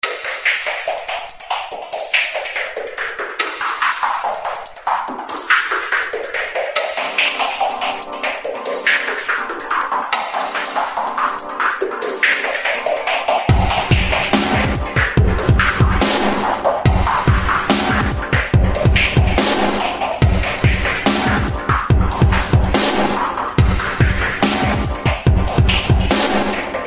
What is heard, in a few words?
Dubstep